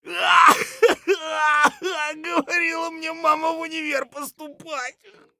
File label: hurt near death; Escape from Tarkov; scav4